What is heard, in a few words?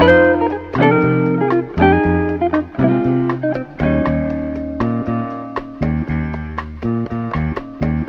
guitar, Phonky Loops